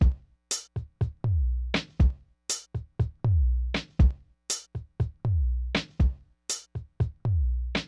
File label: beat 120